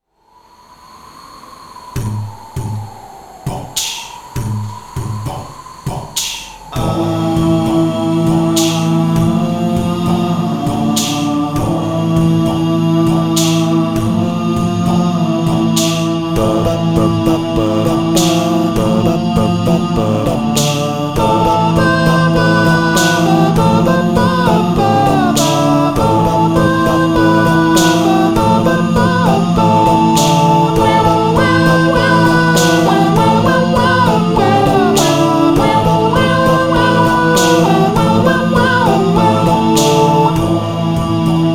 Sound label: acapella; majoras mask